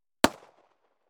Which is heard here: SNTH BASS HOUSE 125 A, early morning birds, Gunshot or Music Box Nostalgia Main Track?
Gunshot